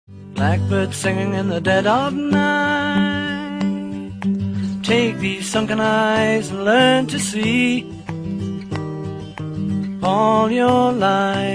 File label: beat